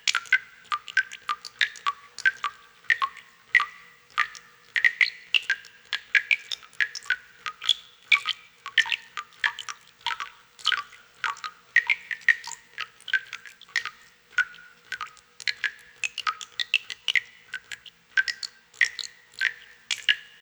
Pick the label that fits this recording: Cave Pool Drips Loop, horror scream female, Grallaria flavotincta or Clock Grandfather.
Cave Pool Drips Loop